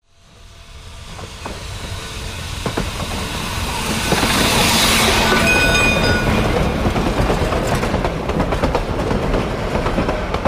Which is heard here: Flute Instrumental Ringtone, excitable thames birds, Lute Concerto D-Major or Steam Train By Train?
Steam Train By Train